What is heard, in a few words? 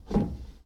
Foosball Forward Rotate